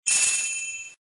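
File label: inspiration glow fun sound material; vdohnovenie svechenie zabavnij zvukovoj material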